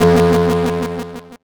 Title Transition Delay Short; Production Element Title Transition

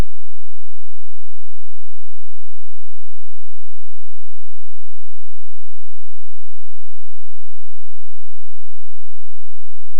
dc offset negative 3dB

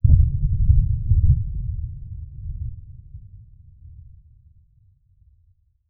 Explosion Distant Military War; Fire and Explosions

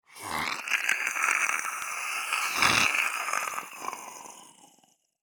Horror Demon Hissing

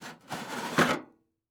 Sports Grill Metal Grease Trap Sliding Out

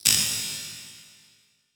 Transition Hit Impact Ping; Adobe; Production Element

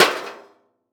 Impact Sheet Metal Impact Machete Hit Car Part

